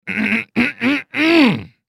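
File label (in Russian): zvuki pershenie v gorle kkhm, sounds tickle in the throat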